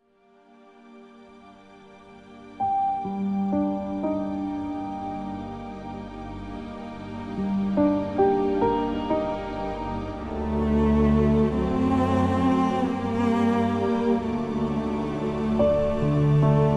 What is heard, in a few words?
Instrumental